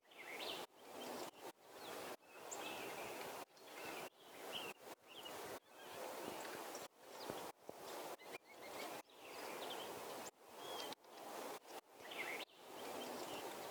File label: Drum Loop 140 BPM Ambience; Loop Stems; Cymatics; DOPE DRUMS VOL. 1; Wanted